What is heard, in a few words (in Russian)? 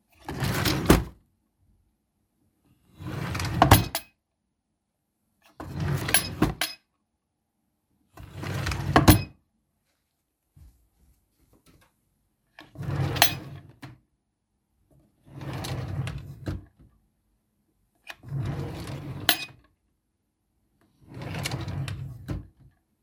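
wooden with a brass handle on a bracket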